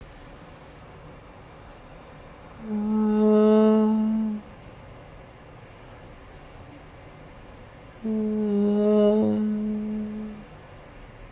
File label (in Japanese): 外転からの起声